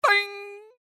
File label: Weird Noise Yong